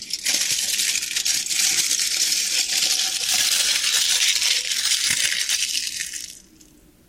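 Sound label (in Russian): алюминиевая фольга хрустит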